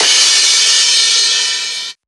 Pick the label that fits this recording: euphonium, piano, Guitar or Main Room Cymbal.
Main Room Cymbal